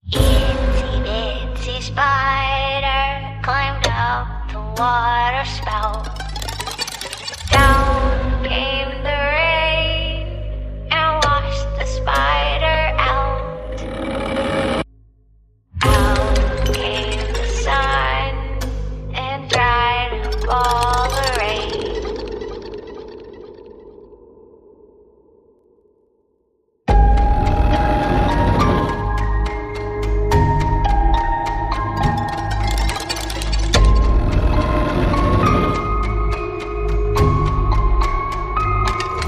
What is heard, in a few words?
Itsy Bitsy Spider Horror